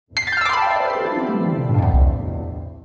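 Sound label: arpeggio old days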